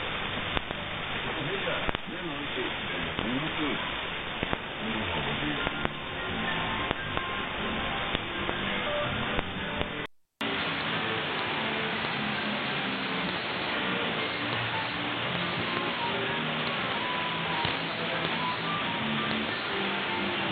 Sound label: Radio 11780khz AM; Radio Nacional Brasilia